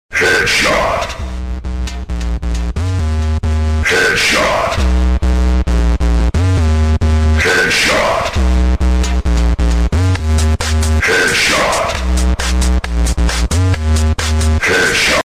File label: win